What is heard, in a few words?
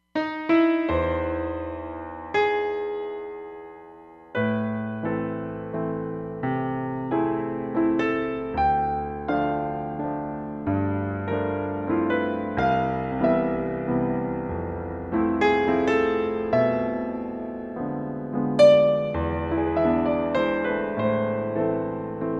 Ultimat Grand, Roland Fantom XR